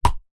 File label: handball slap hit; sport